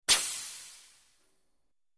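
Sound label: Respirator Air Exhale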